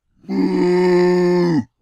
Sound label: minothaur war cry; server-nalc